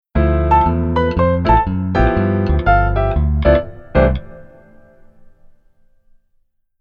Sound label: Keyboard Voicing